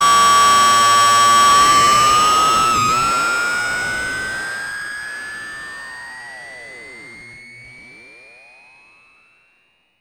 VCS3 FX, Synth

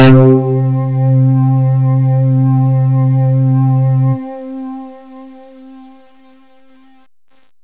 synth; Zoom - Release 2 (1996); Active Software; synths

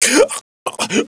choke; SINDEMO; player; blade

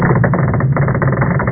tank; bump n burn

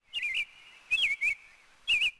day bird; DAoC

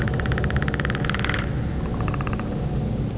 predator background

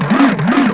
whirl

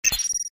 msg box; habilidade

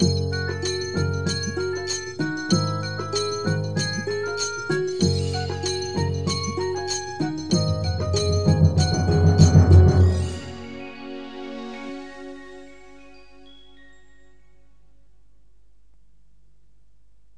tune; ancient

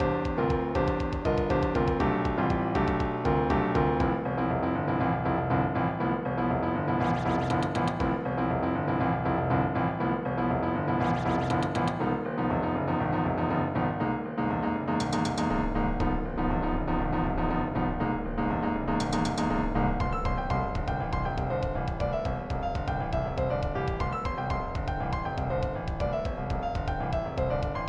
FUNKY; EuroCD 3

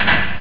BUTTON; Abuse

